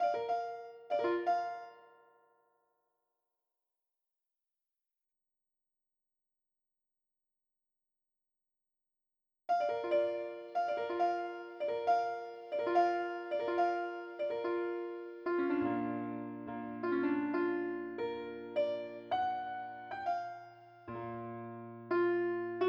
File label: piano